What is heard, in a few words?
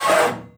move, hinges drawers; Half Life Alyx